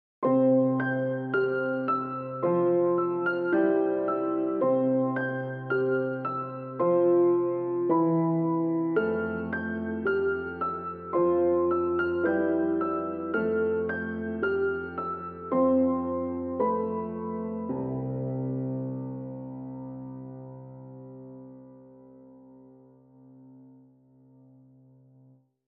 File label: Piano Only; 30sec